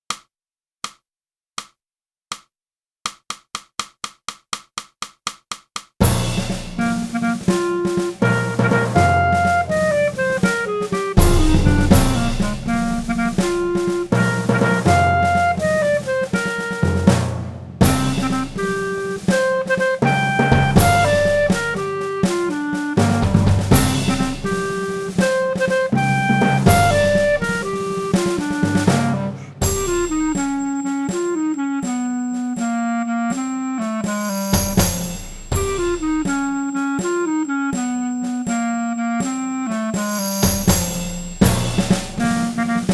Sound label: clarinet